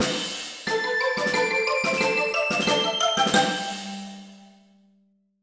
Music Sting - Golden Apple Collected

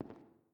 Footstep Glass; Among Us

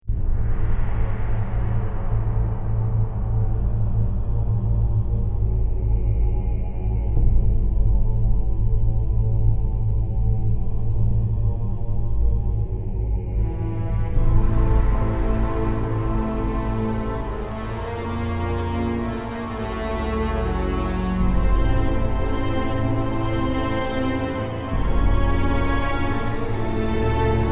race; Galactic Civilizations II

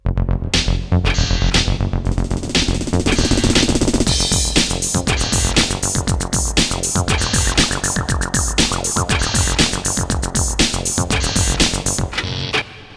Military Win; English; Rise of the Robots; 3DO

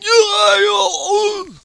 die; super trench attack

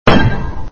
SFX sound (shield hit)

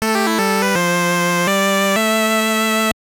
Jingle, gb; 4-in-1 Fun Pak Volume II

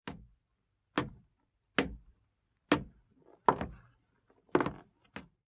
Footsteps wood limping; the 39 steps

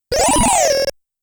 Credit Sound

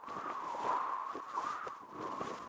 Scrolling Flag Slow

